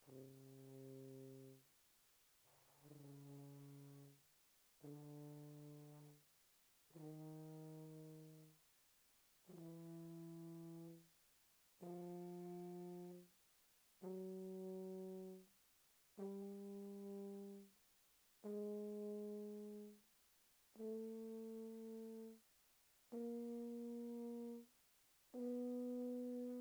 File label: Tuba pp C3 C4